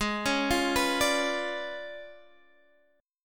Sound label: C sharp 7th Flat 9th; chord shape